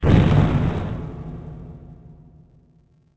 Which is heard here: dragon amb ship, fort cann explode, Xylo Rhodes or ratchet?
fort cann explode